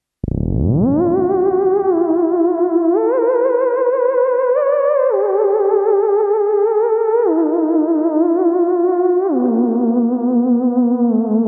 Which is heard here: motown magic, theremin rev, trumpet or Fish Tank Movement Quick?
theremin rev